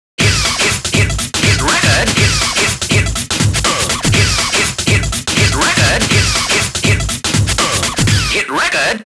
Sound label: Groove Rush